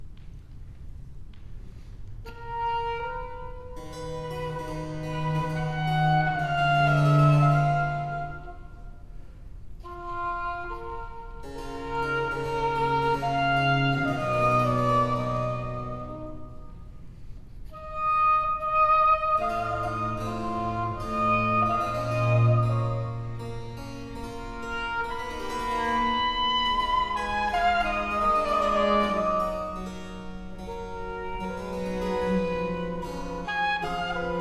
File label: Sonata for Oboe and Bassoc Continuo in G major Adagio; Giovanni Battista Sammartini